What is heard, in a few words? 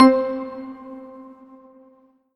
roland pn d10 electric tine c4